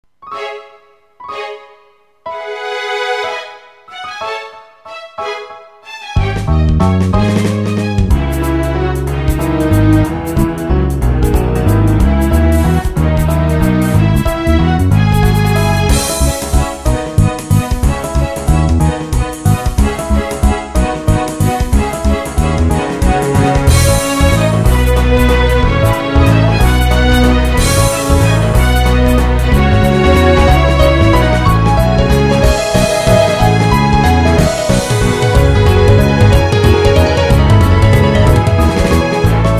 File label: waltz